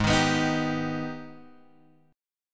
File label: G sharp Minor Major 7th G sharp down Guitar Drop D